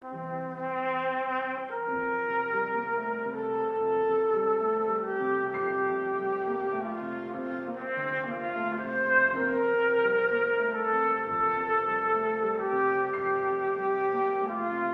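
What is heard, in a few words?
Trumpet Concerto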